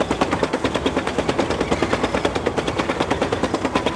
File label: treads tractor; bt7 speed4